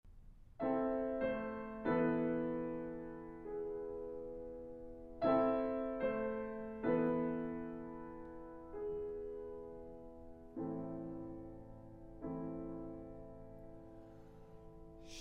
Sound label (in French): Quatuor vocal Chansons; Jules Massenet; Chansons des Bois d'Amaranthe